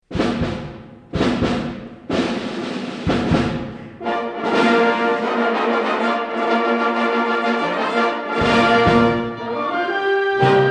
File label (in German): fanfaren